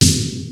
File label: SPD-8 KIT; Rubber Chicken Software Sample Bank; DRUMS + PERC